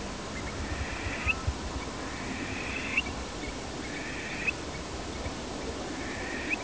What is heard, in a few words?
call, burhinus oedicnemus; This call was uttered all year round, either spontaneously or after playback stimulation. In this case it was recorded on breeding ground.